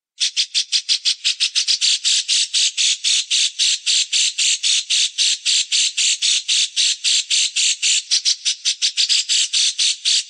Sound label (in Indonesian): masteran dahsyat cucak jenggot